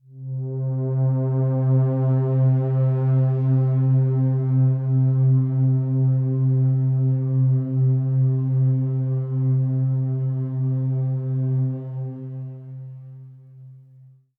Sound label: Pad C, Synth Prophet One Shots; Electronisounds